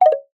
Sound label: multimedia tone short select three tone wooden